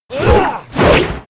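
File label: Mob attack; Monster; 야수맹 흑룡결; 야수백통; 2단계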